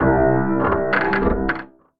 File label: Scan Cloud Fast, Granulator; Ableton; Granulator III; Device Templates